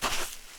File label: sand; firefight